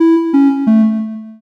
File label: picosad